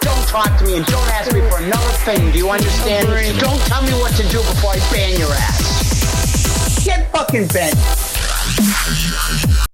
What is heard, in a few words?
dubstep bend